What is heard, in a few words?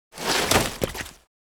Mud movement sloshing squish